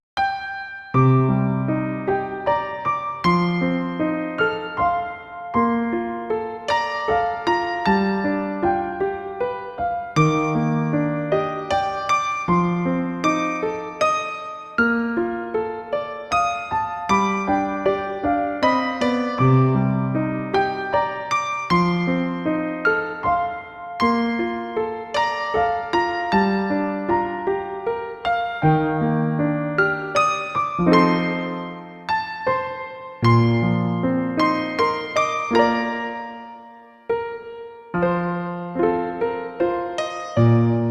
dreamy lens piano; daily life

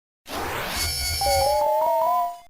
poison

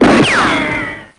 gun shot; aai2; knightley

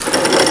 grinding

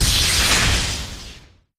thunder; amara

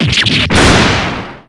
break; patrick

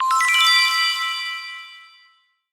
sparkle; drv3

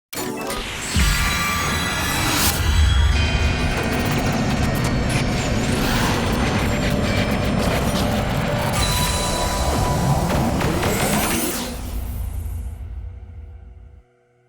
memory flashlight; drv3

